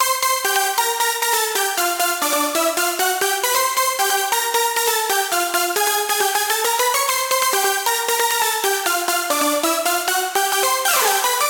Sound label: synth